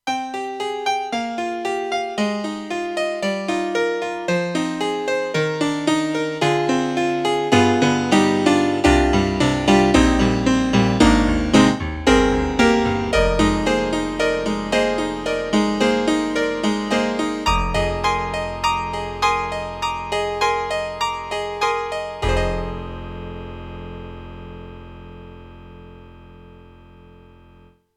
YAMAHA TG100 GM Brite Pno

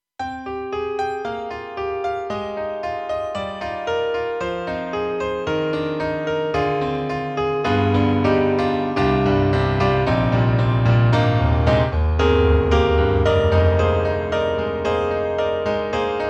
Bright Piano; KORG M3 XPanded Program

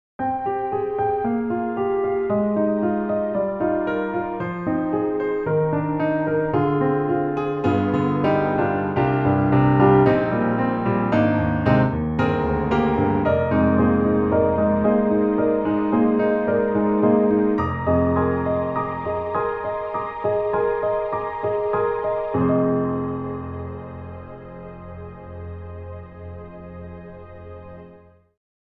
piano strings; EastWest; Quantum Leap Goliath

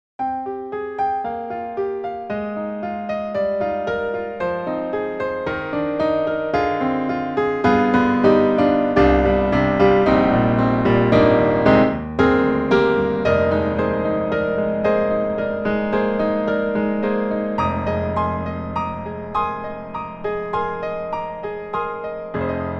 Grand Pianos, Bosendorfer, Studio Bosendorfer 290; Synthogy; IvoryII

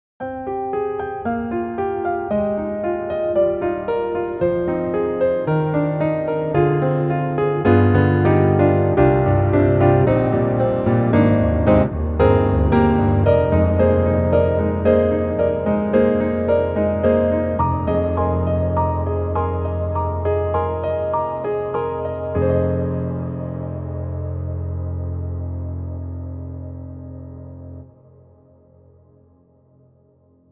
Custom C7 Wash; Spectrasonics; Keyscape

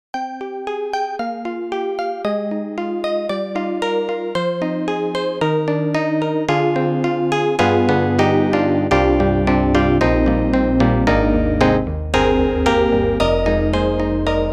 FM Tack Piano; Steinberg HALion 7

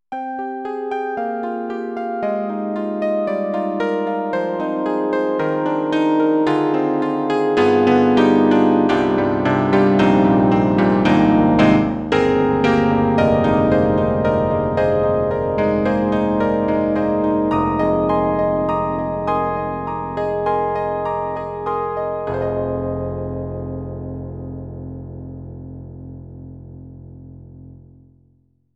SD-90 Solo SA Piano; EDIROL

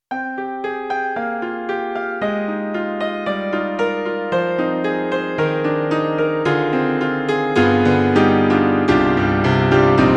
Roland SC-88ST Pro Piano 1

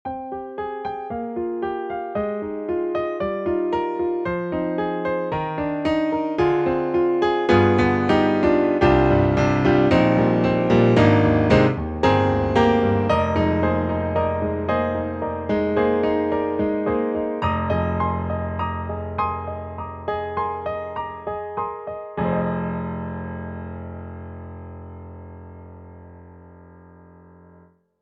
concert grand piano; IK Multimedia; SampleTank2.5XL

